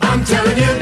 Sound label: i'm telling you sung